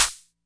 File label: Snare, techno drum